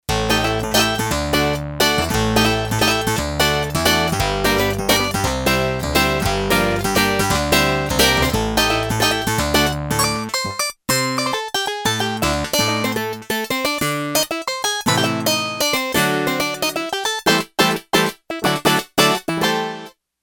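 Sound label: Wire String B, Yamaha TX802; Factory Patches; Voices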